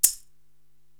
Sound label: Maracas Pull, Korg TR-Rack drum machine